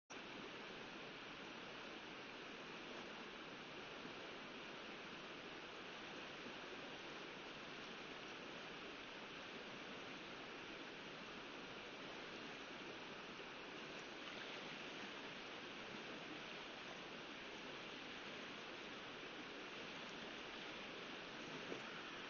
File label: riverside